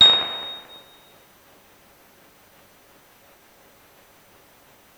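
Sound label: deep A#6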